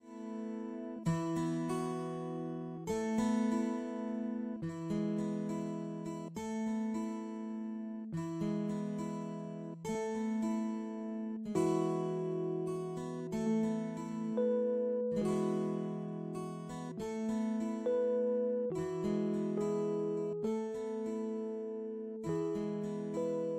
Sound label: Instrumental